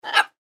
Bird Parrot Squawk, Bird Parrot Squawk Light; Animal; Bird Sound Effects

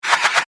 Fan Blade By, Science Fiction; Spacecrafts; BlastWave

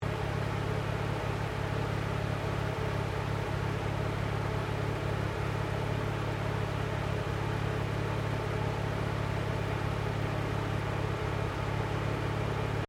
CAR MAZDA RX7 SERIES3 EXTERIOR RADIATOR IDLE LOOP; Car Mazda Rx7 1983 Series3 Exterior Radiator Idle Loop; Cars (Sound Effects:Vehicles)

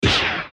button record scratch; Multimedia; Buttons

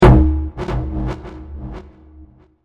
Distorted Drum Hit; Drums; Musical

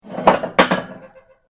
METAL GIRDIR MOVE; Materials

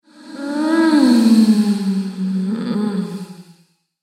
Femme Fatale Moaning With Reverb; Sex Sound Effects

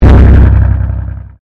Convolution Pulse

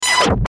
Glitch Beep Drop, Glitch Alarm Warble; Production Element Imaging Element Accent Transition

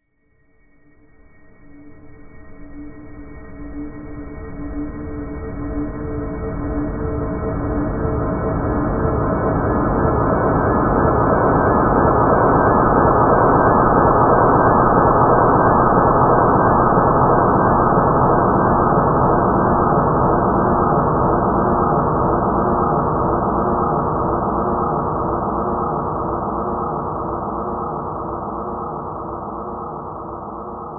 Ghostly Paranormal Drone; horror; Ghost Sound Effects

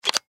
Switch Slide; Web Interactive; Technology; Multimedia; Slides; Objects

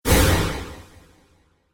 Modulation Whoosh; Whoosh Sound Effects

